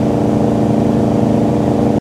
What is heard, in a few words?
Dodge Ram High; car simulator 2